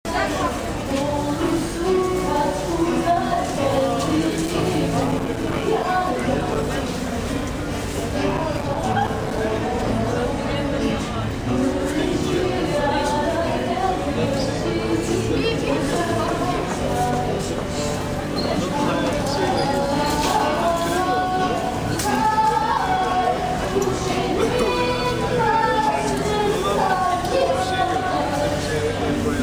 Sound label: boat singers